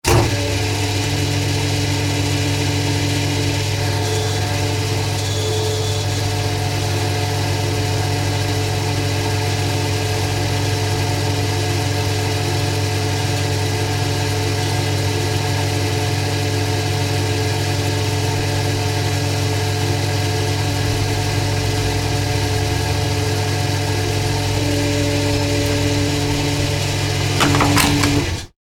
industrial machine fuel pump; Petrol / Fuel Pump Operating; Machines; Machinery; McKinneySound

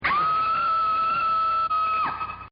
Woman SCREAM; Screaming; Voice

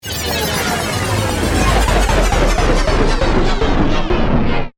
Sweeper, Digital, Stutter, Descend, Transition; Production Element, Imaging Element, Accent

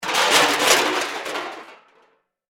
Crash Metal Object, Crash, Metal, Objects; Crashes; Blast Wave

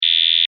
Small laser loop, Laser, Small: Firing

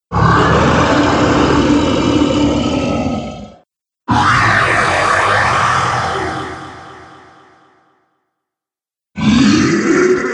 Three Dragons, monster; horror

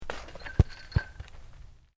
Sport Soccer Into Net, Soccer ball sounds; Soccer Sound Effects

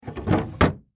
Foley Desk Drawer Close; Drawers; Household

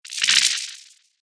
Game Water Squirt, video game

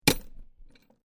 Chain Impact Chain Metal Impact; Metal Sound Effects; LYN103 package of Chain Sounds; Lynne Music

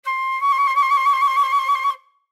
Flute Trill Fast; Woodwind Sound Effects; Blastwave

